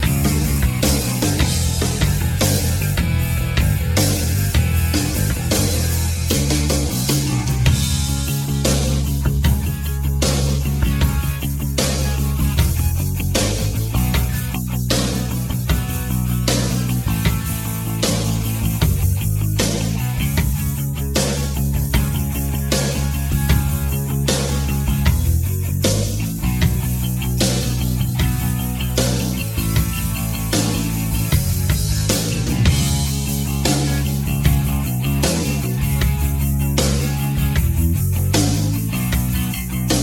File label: solo very slow